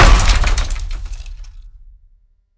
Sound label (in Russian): ryitsar padaet polnyiy grohot; zvuki dospehov